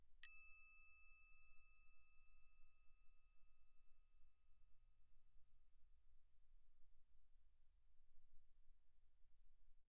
crotale Eb6 pp; Percussion; crotales

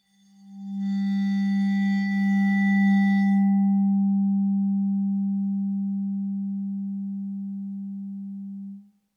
Vibraphone bow G3 stereo; MIS Pitches - 2014; Percussion

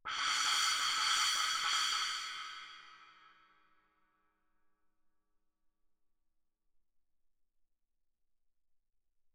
splash, mallet, roll, suspended cymbals; Percussion

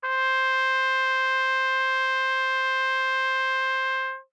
Trumpet novib ff C5 stereo, Bb Trumpet; MIS Pitches - 2014; Brass

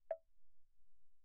granite block, pp; Percussion; hand percussion; graniteblocks

